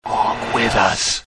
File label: whisper